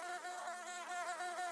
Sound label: Bee Mini Fly Loop; Hollow Knight